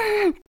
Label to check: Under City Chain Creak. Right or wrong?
Wrong